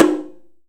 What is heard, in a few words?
shime, Drums & Perc; AKAI S6000 CD-ROM - Ultimate